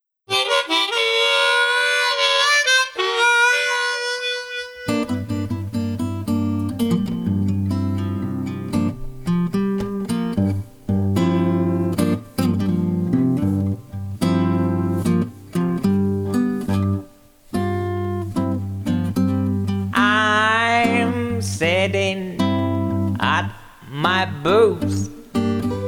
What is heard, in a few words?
blues; cdhoboes favorites collection vol13